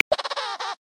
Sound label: Emperor Penguin, Polar Region; Xiaomi Redmi-Note-8-Pro